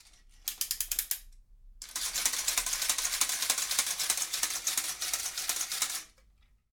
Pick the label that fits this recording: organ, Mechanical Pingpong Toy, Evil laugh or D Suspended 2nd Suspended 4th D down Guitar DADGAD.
Mechanical Pingpong Toy